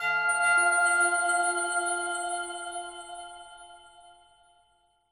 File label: Rebloom Bell; Musician